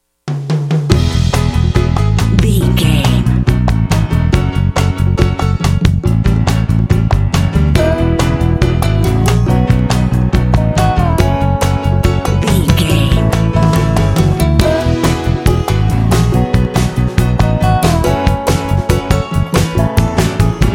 country dobro hillbilly fun

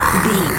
lizard hurt hiss

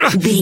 voice male hurt pain

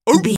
seal walrus bark single

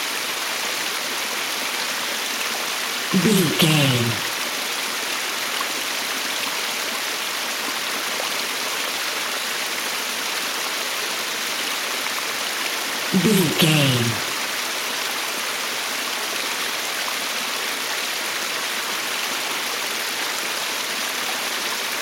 river stream flowing water loop long version

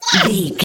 goblin fairy attack low

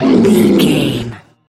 troll monster battle groan

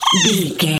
goblin fairy attack fast

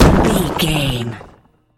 rock smashable hit impact large

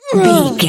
voice female hurt pain

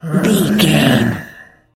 voice female growl groan

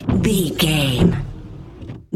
car windscreen windshield wiper

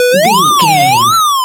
retro synth wobble

